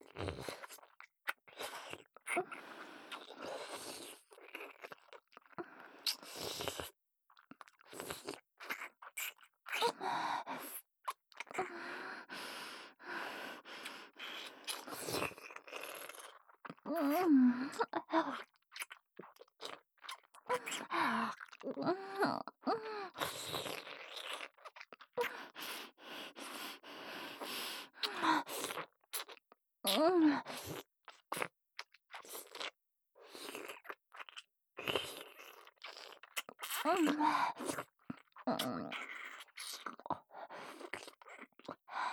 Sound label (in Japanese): ディープキス, ASMR; 美冬; KU100; ハーレムASMR